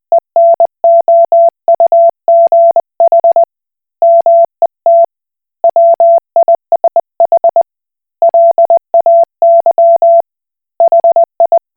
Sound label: Koch Morse